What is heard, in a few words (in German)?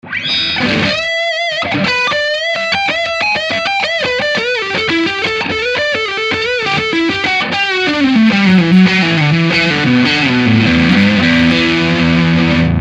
66 brett bridge, Strat; schwarzbach; VP-Strat-66